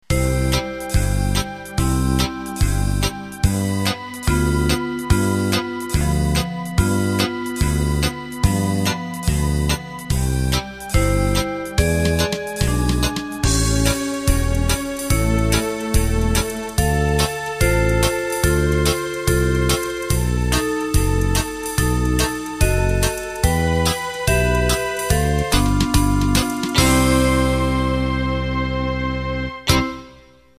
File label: Broadway